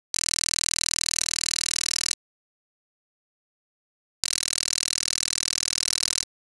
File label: buzzer, ringers